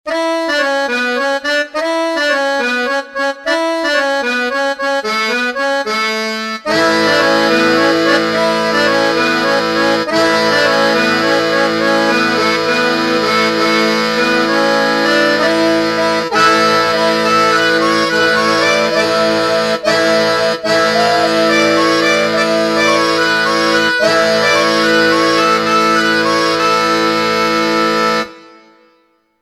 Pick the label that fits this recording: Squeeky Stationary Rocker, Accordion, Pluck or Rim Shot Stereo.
Accordion